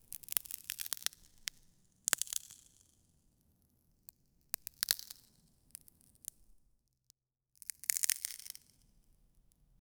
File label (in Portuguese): estalos do solo como se, earth